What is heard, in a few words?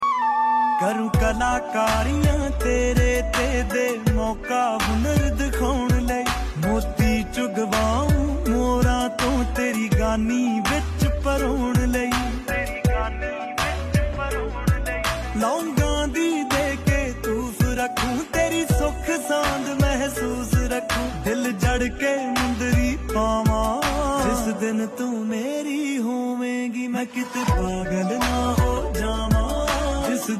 Ringtone